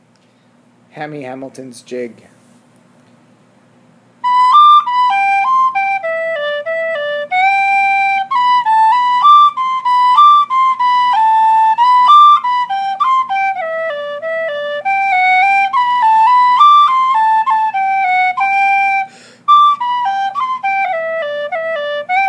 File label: jig, irish flute and whistle; the woodcock